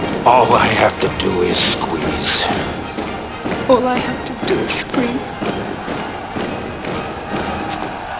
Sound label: squeeze